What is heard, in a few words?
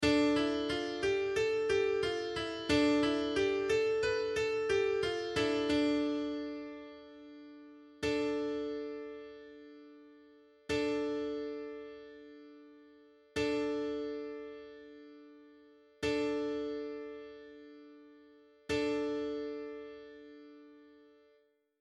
piano